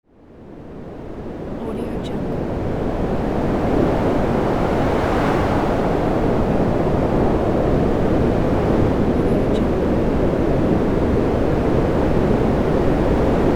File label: wind